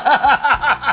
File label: sample, male laugh